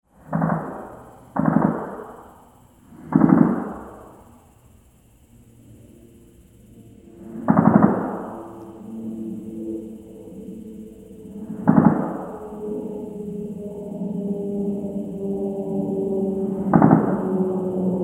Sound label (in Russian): стрельба